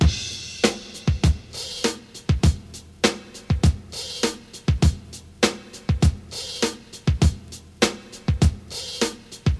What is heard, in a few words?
100 bpm drum loop sample d sharp key